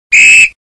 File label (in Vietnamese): Tieng thoi coi